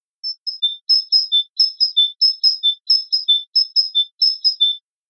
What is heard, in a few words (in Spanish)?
primavera carbonero herrerillo